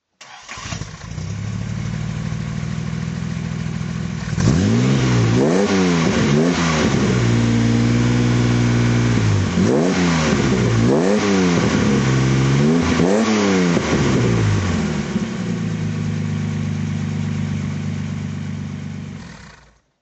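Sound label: Jaguar E-type42 fhc throttle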